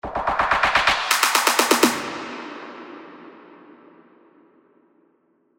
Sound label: Fills (125 BPM); TECH HOUSE